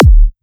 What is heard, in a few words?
Kick, Psytrance; Drum Shots